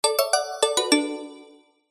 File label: multimedia alert notification mallet musical short positive